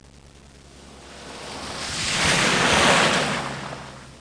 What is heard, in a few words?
splash; WAV Sound Effects; transpo; cars